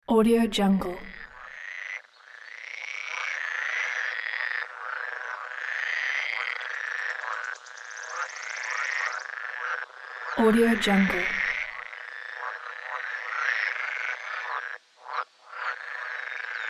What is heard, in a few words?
frogs